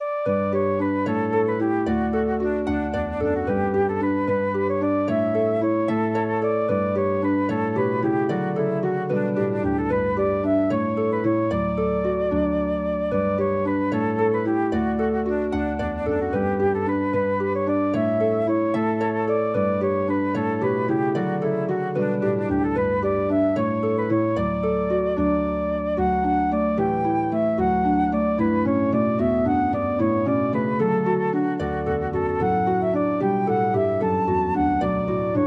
for flute harp

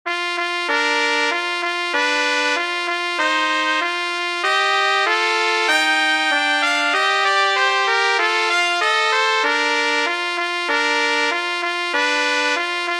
scottish dance; kuhlau friedrich

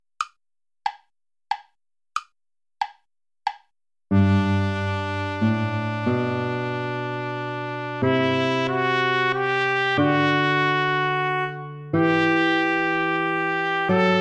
sarabande play along without guitar

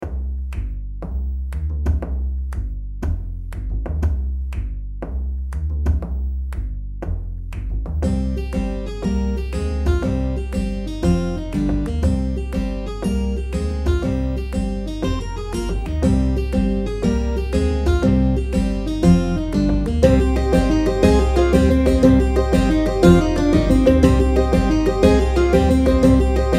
tavern jig

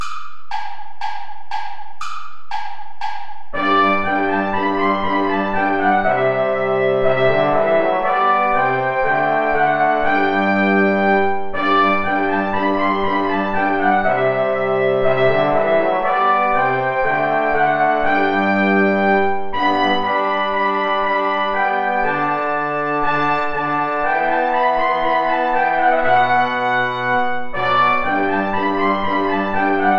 play along without trumpet; steuerlein johann; mit lieb bin ich umfangen

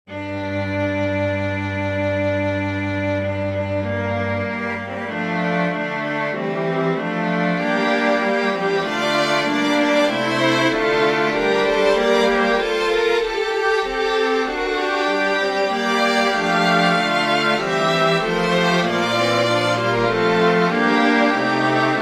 for string quintet; arcadelt jacques; suis pas sot

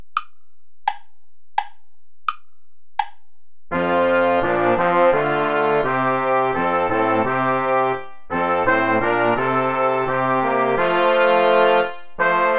play along without trumpet; lord sing with lips and heart

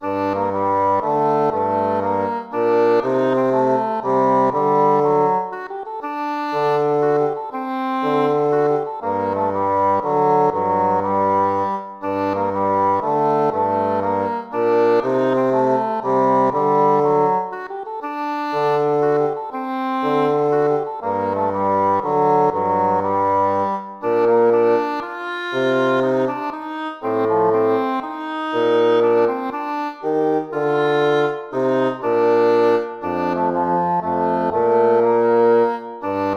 baroque minuet minor

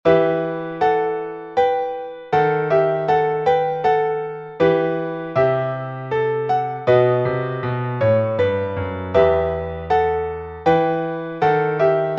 menuet; Charpentier Marc-Antoine

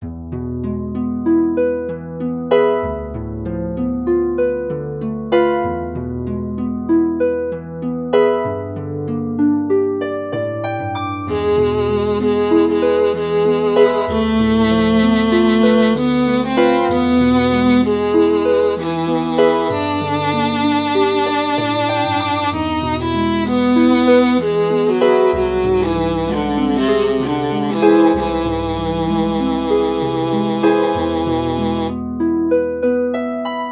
for viola harp; Claude Debussy; beau soir

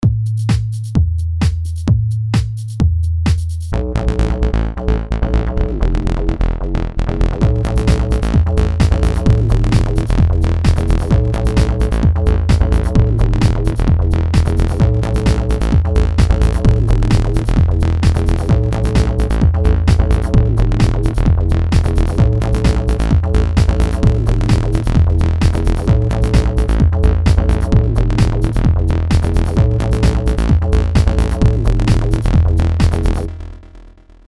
beat house

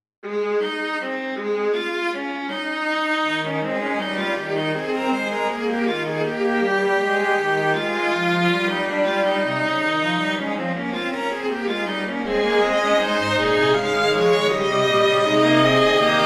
fugue major for string quartet; bach johann sebastian

